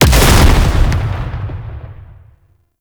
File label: Explosive; Gameplay; Warframe; Nineteeen Ninety Nine; Deep Archimedia